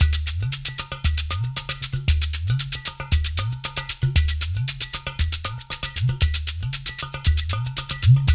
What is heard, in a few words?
UDU, Udu Hip Hop